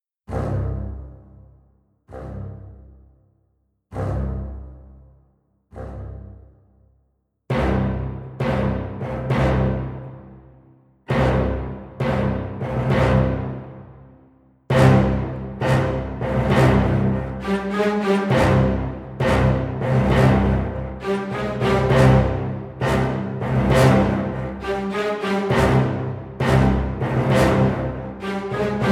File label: low brass staccato tutti